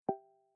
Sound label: HAPTIC SEC UNLOCK SUCCESS, ui; sharp audio